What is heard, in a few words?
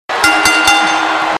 bell